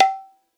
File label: khol ta